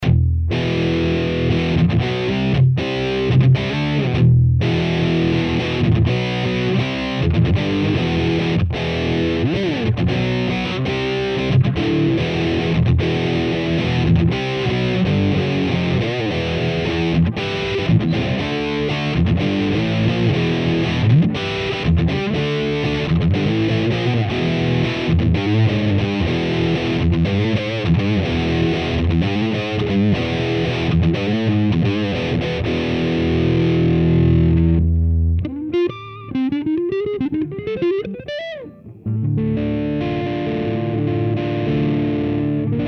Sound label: jcm800 pre; POD HD 500